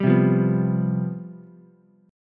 C Major 7th Suspended 4th, down, Ukulele; strummed

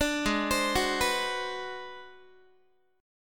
Ab 7th Sharp 9th Flat 5th Guitar, chord; Ab7#9b5 arpeggiated; JGuitar

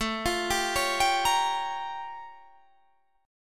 A Augmented 7th Flat 9th A; A+7b9 arpeggiated; 1,3,sharp5,b7,b9; 17,20,17,18,20,18

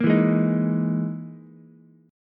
E Major 7th Flat 5th, Ukulele; EM7b5 strummed; JGuitar

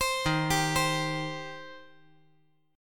Eb 6th Eb down

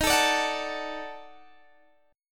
Eb Minor Major 7th Flat 5th, Guitar DADGAD

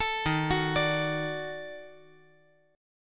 D sharp Minor Major 7th Flat 5th D sharp; arpeggiated

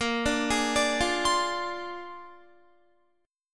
Bb 9th Bb down Guitar 4F,4A,5C,5G,5C,6E; arpeggiated

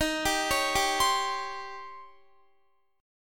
Eb 13th, Guitar; Eb13 arpeggiated; JGuitar

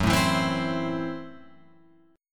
Gb Minor 7th Flat 5th Gb down Guitar Open F; Gbm7b5 strummed; JGuitar

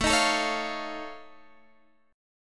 F Augmented 7th A, chord

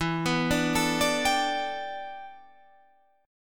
E Minor 11th E down Guitar Standard; Em11 arpeggiated; JGuitar

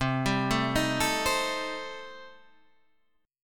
C Minor 6th, chord

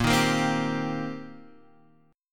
Eb 6th Bb, guitar; Eb6/Bb strummed

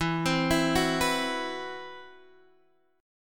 C Major 7th Flat 5th E; CM7b5/E arpeggiated

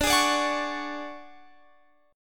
D Major 7th Flat 5th D down Guitar Open F; DM7b5 strummed

